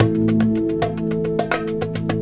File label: Dumbek Tone, Drums; Sonic Foundry (Sony Creative Software); Friendly Fire; Marc Steve